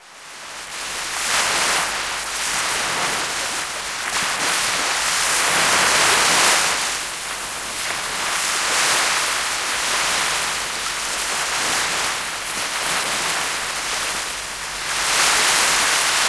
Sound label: SEA; XXL 800 Production Effects